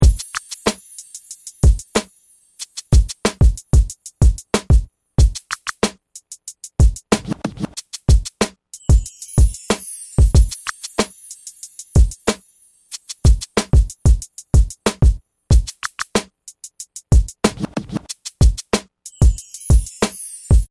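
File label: 3step RnB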